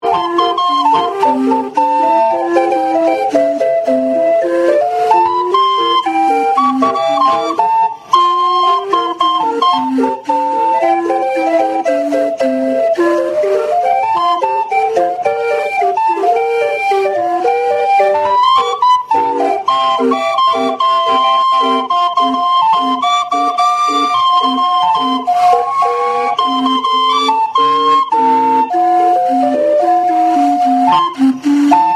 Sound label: calliope; natchez